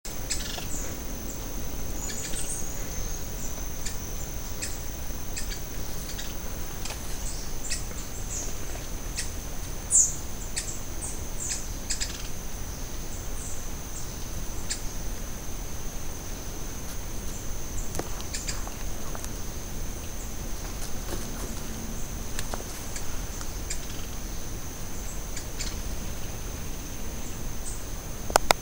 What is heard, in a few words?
yellow vented woodpecker